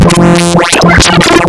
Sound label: monowave